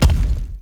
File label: Obelisk Stone Connect